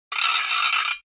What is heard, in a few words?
noisemaker; NCH Library